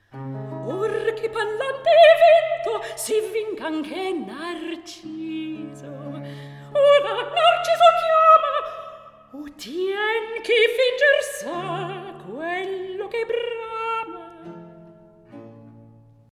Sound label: Recitativo; Or Che Pallante E Vinto; klassicheskoe